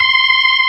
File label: full fast C6, ToneWheel Organ; E-MU Formula 4000 Series Vol. 3; Analog Odyssey